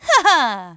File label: haha; pauline; SM64 CO-OP